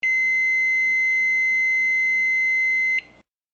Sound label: microwave; Good Timing 1.50